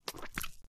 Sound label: slosh; Lewd SFX